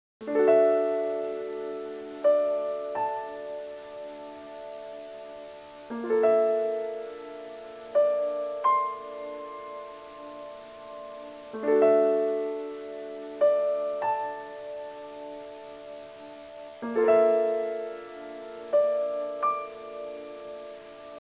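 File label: piano background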